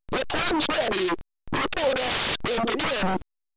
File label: bomb; elyzium